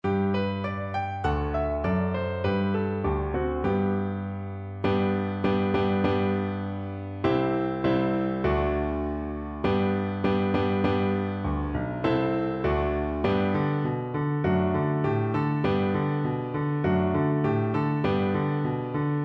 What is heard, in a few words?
early one morning Recorder